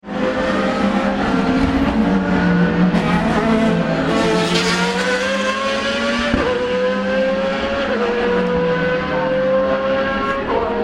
lamborghini at start finish

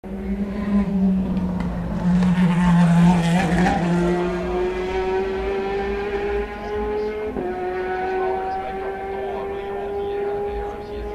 Ferrari 430 after Ford Chicanes; at Thursdays qual

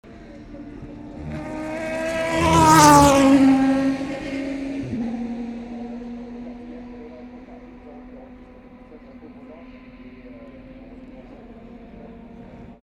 Aston Martin Tertre Rouge; Saturday afternoon

